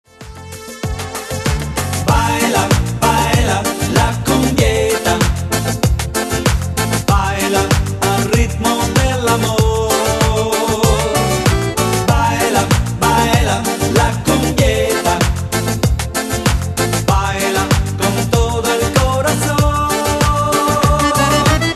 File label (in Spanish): Cumbieta